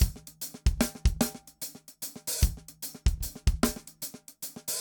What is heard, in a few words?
funk 6 4 150; odd loops